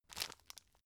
tissue grab soft; objekte; taschentuch

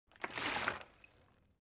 bottle water sparse upend slosh; berufe

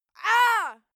female attack; schreie; menschen

